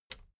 plastic button small latch release

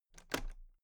toolbox latches open regular

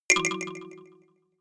notification kalimba delay second

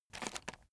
chocolates take soft

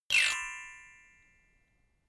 notification glockenspiel slide down hard

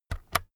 keyboard external key press slow

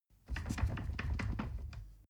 ladder wood rattling

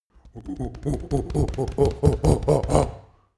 monkey chest thumping grunt

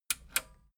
metal button radio click disable slow

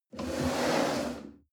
chair wood slide on floor medium

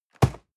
box hit hard dry

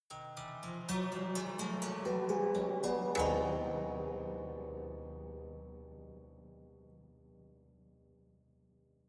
haunted strings chromatic rise

